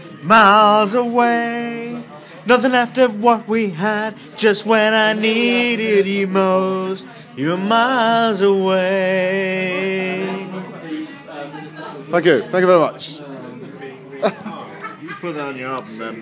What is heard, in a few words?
singing in a pub